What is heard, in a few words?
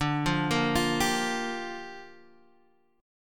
G Minor 7th, D